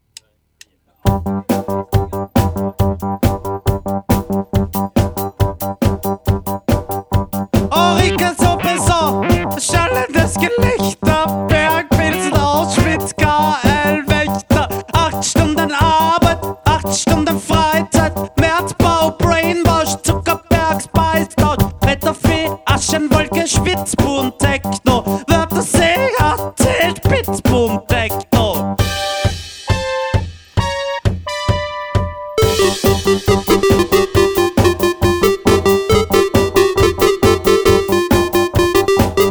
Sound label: techno